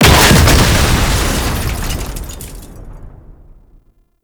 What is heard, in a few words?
Rocket Launcher Explosion; Warframe; Weapons; Tenno; Lasrian